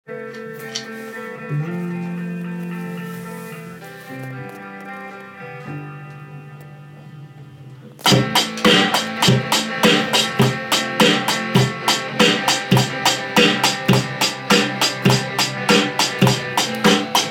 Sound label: 4:4 Rock beat